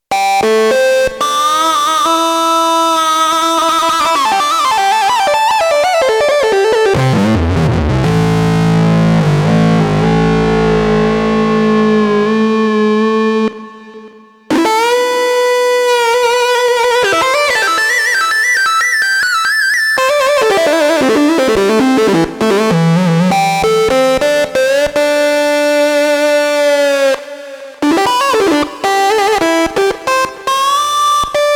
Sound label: Wailing Guitar, Roland JD-800; Factory Patches